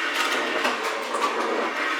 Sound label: wood slide